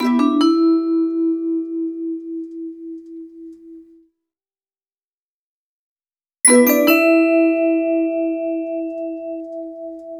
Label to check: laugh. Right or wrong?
Wrong